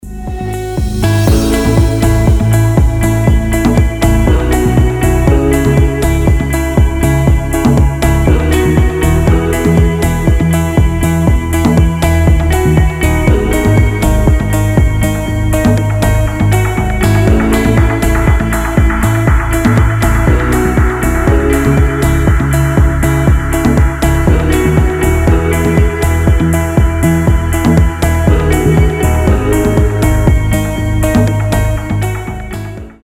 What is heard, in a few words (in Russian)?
zvucnaa melodia; krasiva melodija na budilnik